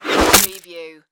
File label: Arrow Impact Wood